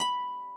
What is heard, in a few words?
harp